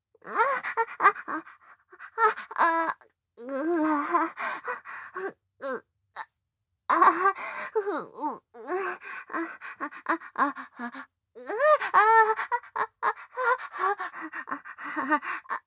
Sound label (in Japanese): 喘ぎ, ASMR